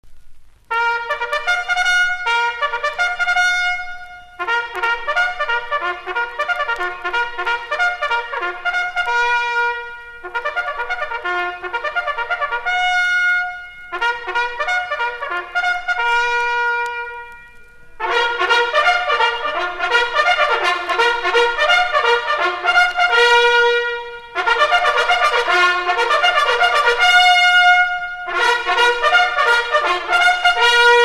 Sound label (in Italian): la sveglia tromba militare bersaglieri